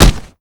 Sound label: long punch; Modern Warfare